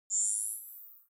ui classic zoom in light

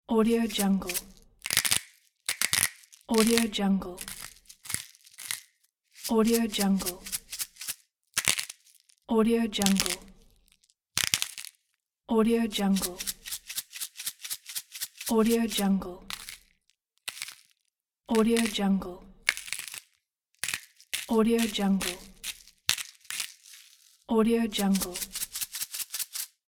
salting salt grounding ground black pepper; federicosoler

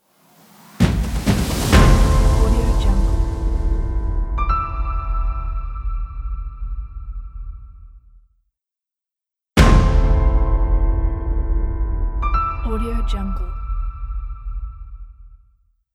logo cinematic emotional trailer; vesh-studio

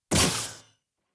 synth power bursts start up

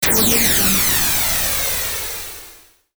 cascading burst hit; sound ideas

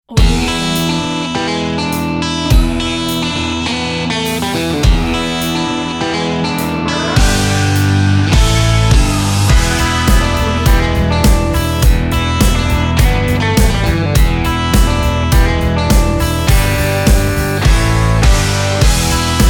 blues country

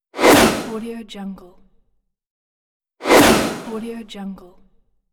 swoosh; alexsandal

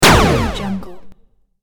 laser shot

